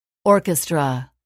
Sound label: orchestra